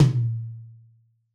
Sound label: Yamaha DJX 2B, Room Tom